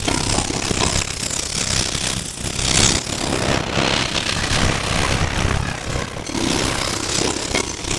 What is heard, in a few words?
FX Ambient; Drums & Drones